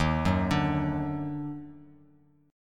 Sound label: D sharp Minor Major 7th; arpeggiated